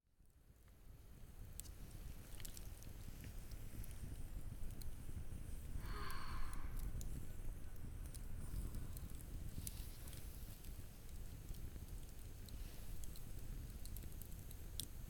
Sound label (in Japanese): 洗濯物をたたむ＆焚き火の音